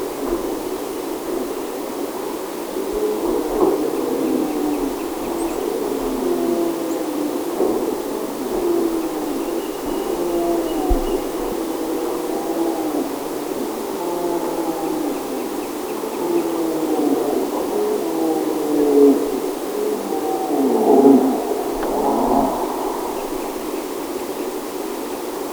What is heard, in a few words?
Brown Bear Ursus arctos